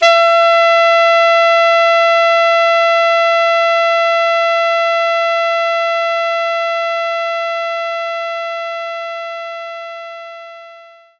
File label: Alto Sax E5